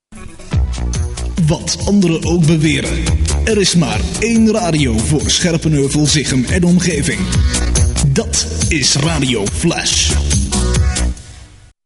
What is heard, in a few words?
jingle; Flash